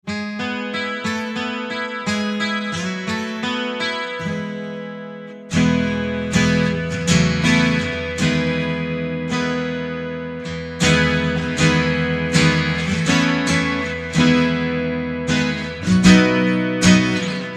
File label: acoustic, guitars